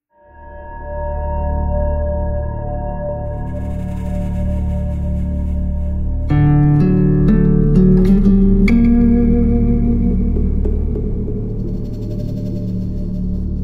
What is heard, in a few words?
Atmospheric expansive dreamy intriguing discovery